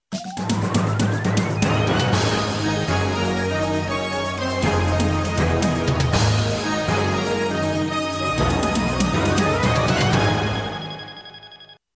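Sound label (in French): Jingle JT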